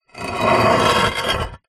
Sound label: foley roof tile slide on another; zapsplat